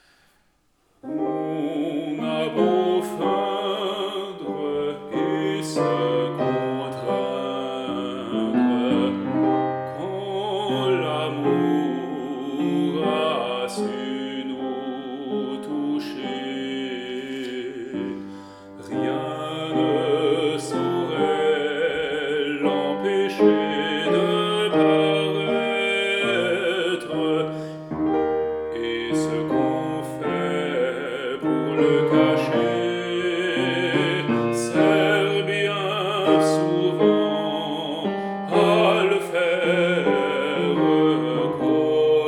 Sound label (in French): Alto ornements; Lambert; On a beau feindre